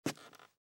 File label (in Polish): krok pojedynczy; apocalipsis